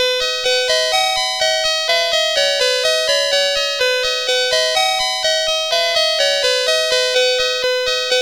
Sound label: Xylophone, CSG8580R5